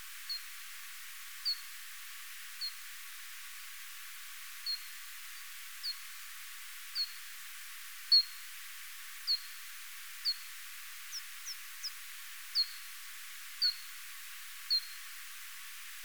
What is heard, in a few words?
Pipistrellus abramus